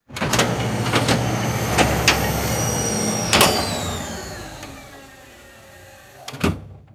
forklift mechanism clanking and powering down; vehicles

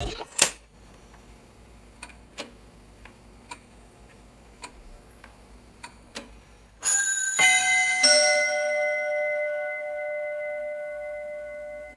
mechanical mantle clock chiming sequence; clocks

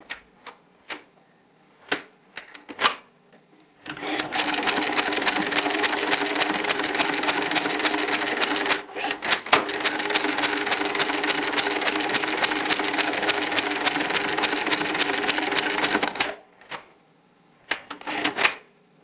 antique treadle sewing machine winding bobbin

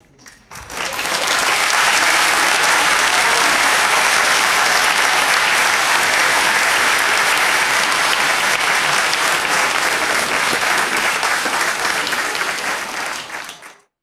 medium audience clapping indoors; crowds

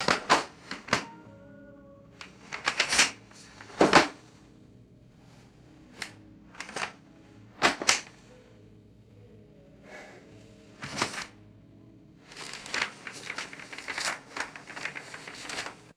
group handling books and flipping pages; foley

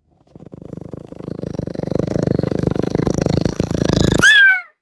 rapid creature purr and short chirp; birds

